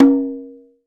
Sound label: Conga Hi Open; Roland BK-5; Percussion